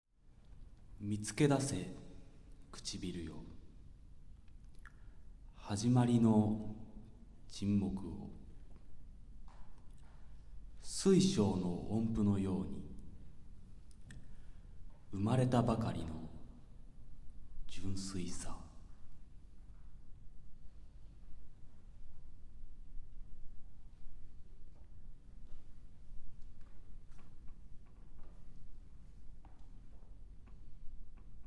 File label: Stone, For Violoncello